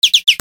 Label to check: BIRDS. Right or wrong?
Right